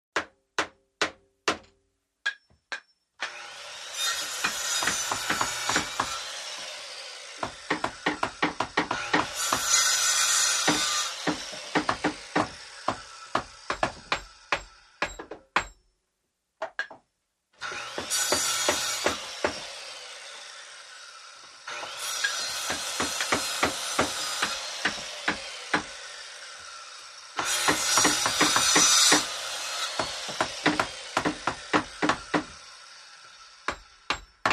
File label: Indoor Construction, construction large